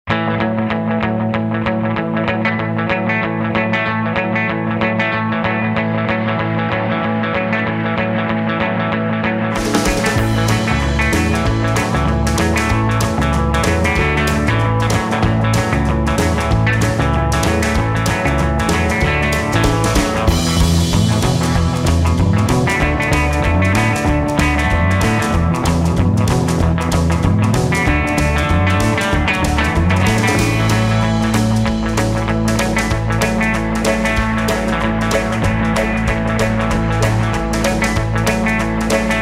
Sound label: blues electric; music on hold samples